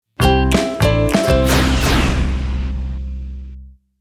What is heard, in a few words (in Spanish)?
AUDIOLOGO; VIAJE X MENOS